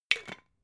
glass bottle massive close cap short

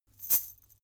chain mail march single right

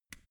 switch small turn off soft

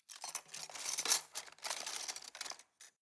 cutlery plastic multiple drawer place soft

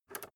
rotary control short turn soft

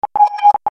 console control

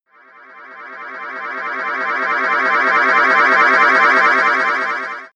alienated inclosing retreating

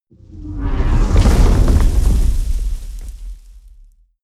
fire whoosh sci-fi large

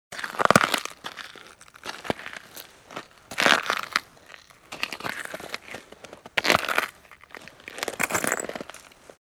steps on ice

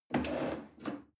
chair wood take slide medium

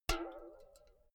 filled can hit ringing long

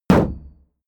shield sheet impact dent

